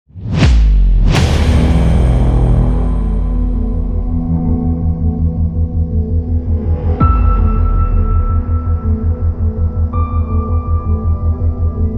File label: Instrumental